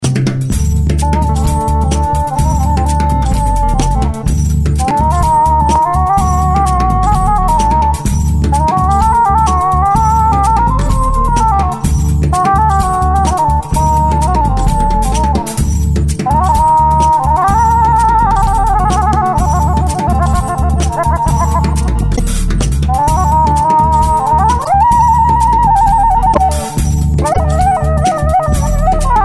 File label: desert keys